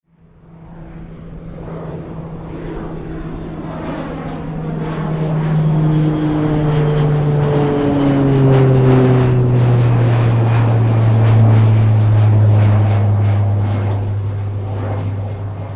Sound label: Vehicle Airplane Double Prop Plane Pass By